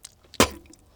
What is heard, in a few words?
Spit toothpaste